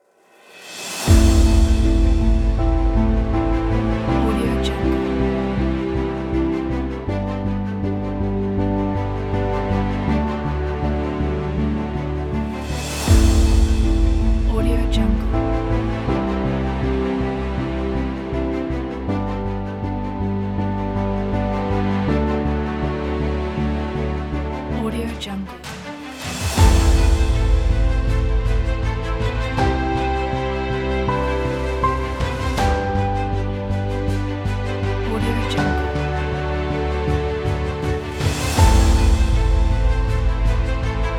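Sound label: epic cinematic pack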